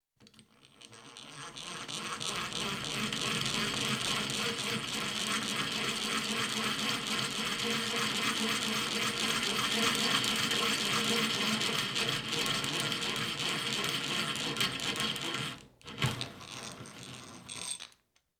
Hand-Drill, Single Hole In Metal; Construction; BBC Sound Effects Library

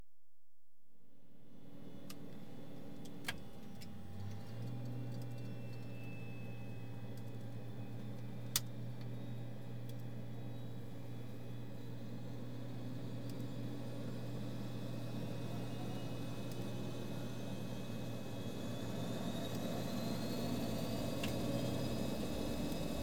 START UP, RUN ENGINES, TAXI, Aircraft; The BBC Sound Effects Library

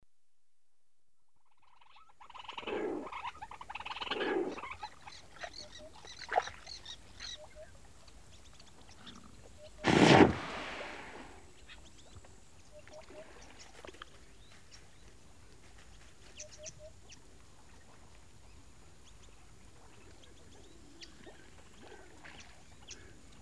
Lakeside, with hippo and birds, daytime; The BBC Sound Effects Library; Africa- The Natural World

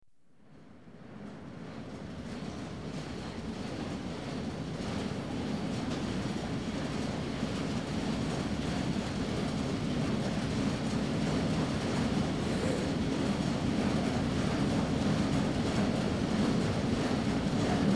London Underground Trains Esca; The BBC Sound Effects Library

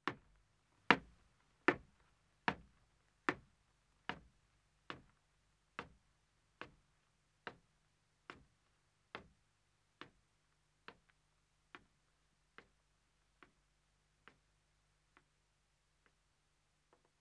Wooden Stairs Ascending, 1 Man, footsteps; BBC Sound Effects Library

